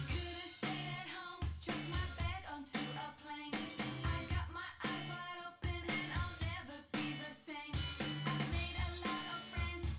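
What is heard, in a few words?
with drums synth conv mix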